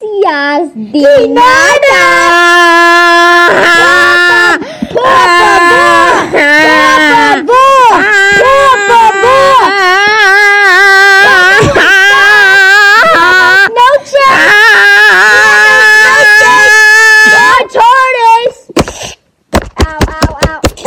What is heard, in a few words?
spanish song; roblox